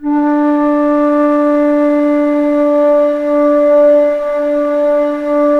ALTO D3, ALTO FLUTE; USB Soundscan vol.23

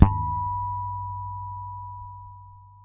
HARMONIC B, PEDULLA BASS; Masterbits CLIMAX 7 - Bass

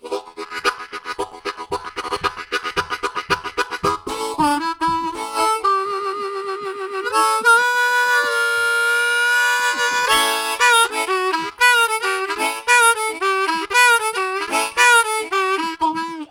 Developing, harmonica riffs; Zero G - Harmonica

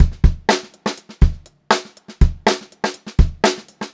122 funky beat, funk groove drumloops; USB Soundscan vol.38; AKAI

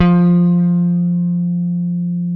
JAZZ GT1F2; AKAI S-Series CD-ROM Sound Library VOL-8

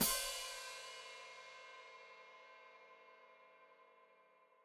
Hat Closed Open, Hi-Hat

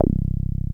303 C1, TB 303

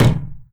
PUNCH, Anime Sound FX; AKAI Anime Sound FX

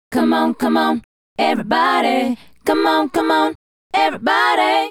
Female Group, Hip-Hop and RnB Vocals

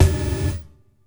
Gated Snare; Univerb; creative gate; Gated Reverb

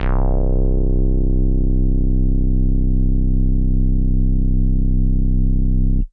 Synth Prophet Bass C; Electronisounds

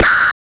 Drums; Distored Reality

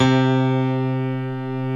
DIG ACU PIANO; OMI - Universe of Sounds

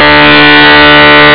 mozart piano; Pirates; ST-XX Amiga Soundtracker Sample Packs

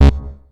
106 Bass C#2, One Shot Bass

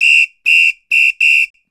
Samba Whistle; Sonic Foundry (Sony Creative Software); Joe Vitale Latin Percussion

